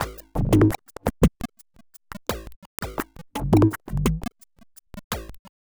Electronica Drumloop; Drums

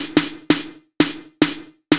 Snare Loop, Drums, Dance Beats

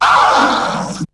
FLIP; SoundFactory; SMPMANIA; EFFECTS

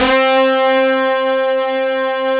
LAYER, SYNTH; SoundFactory

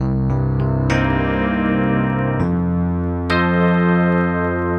Electric Piano, Loops, Keys

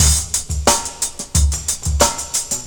Old School Break, Drums

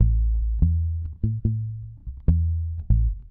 Electric Bass; Basses

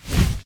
bat fly; theGoonies; Retro

